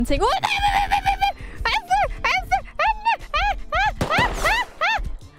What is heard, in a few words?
despair noises; IRyScream